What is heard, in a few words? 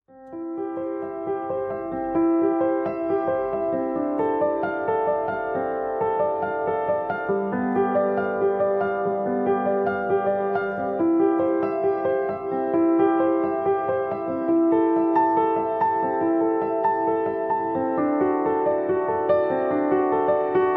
piano recording